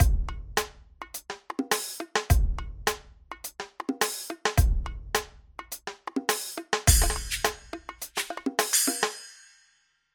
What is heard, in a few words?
punch bongos; rob papen